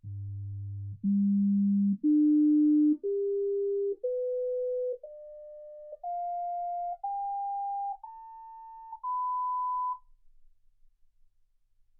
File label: sinus tones 48kHz